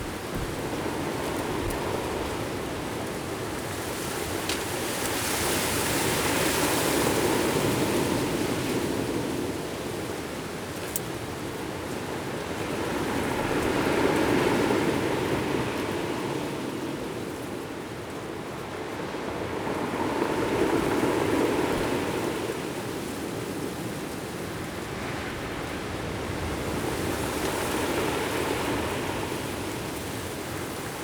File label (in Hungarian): nádas mögül tajtékos óceán